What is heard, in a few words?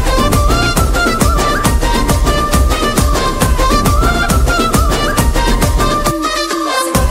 BGM Ringtone, Telugu Ringtone